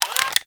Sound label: Rocket Launcher Reload